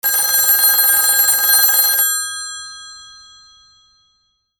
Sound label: PHONES